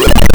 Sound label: THROW; Shadow Knights